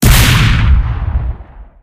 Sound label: shotgun; doom scout; video games; source engine; tf; weapons